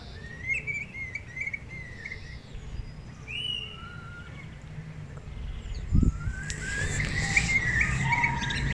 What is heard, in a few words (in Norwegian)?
storspove